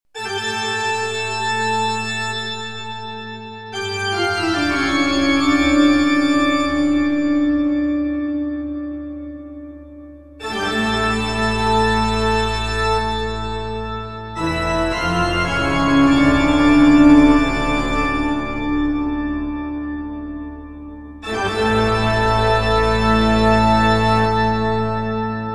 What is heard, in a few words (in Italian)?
TOCCATA E FUGA